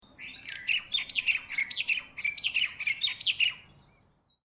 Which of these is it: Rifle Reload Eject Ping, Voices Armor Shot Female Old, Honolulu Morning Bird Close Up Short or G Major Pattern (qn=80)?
Honolulu Morning Bird Close Up Short